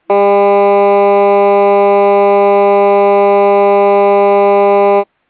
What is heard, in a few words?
Air Horn Steady Horn 400Hz and 800Hz